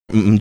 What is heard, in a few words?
"mmmmmm"